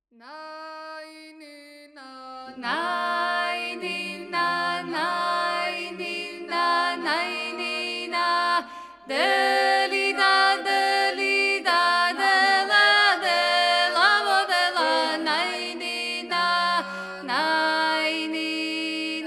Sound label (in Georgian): პირველი ხმა; ნანინა